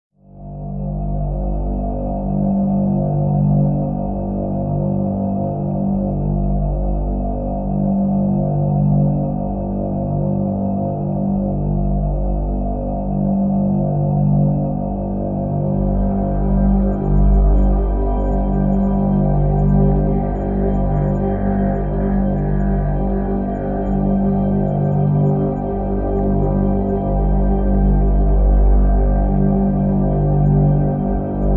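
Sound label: Drone Mystery (G); Brand X Music; Brand X Music Catalogue - Editor's Essentials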